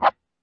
Guitar Amp Coat, Found Percussion Hits; ModeAudio Found Percussion Hits

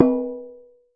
Processed Ethnic Percussion; Doru Malaia Percussion

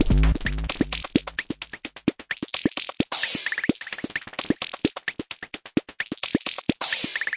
analog, drum loops and breaks; 121 to 130 bpm; soundsonic nks analog berlin

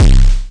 Syn Dr, Overloaded Synthetic Drums; Hip Hop; STYLE KITS (Hip Hop, Etc)

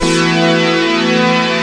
sp12, EMU SP 12; DRUM MACHINES; Kult

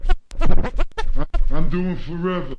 turntable, Scratching and Turntablism

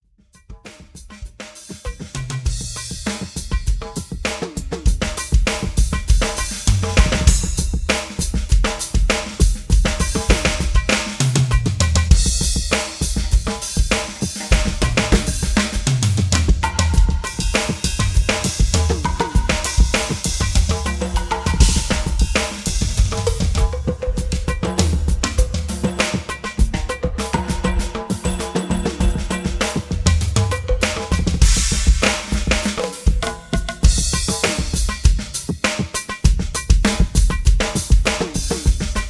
Synth drum noise

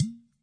machine, Machine Electro Hits; STYLE KITS (Hip Hop, Etc)

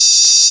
future buzzer, electro FX

